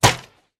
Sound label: crossbow shoot; Minecraft